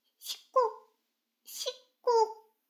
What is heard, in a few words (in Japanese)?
シコシコ音声; 逆時間停止されて